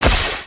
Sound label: Spider Web Attack; creatures mechanical